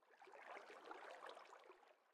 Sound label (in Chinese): 水下水流声; ambient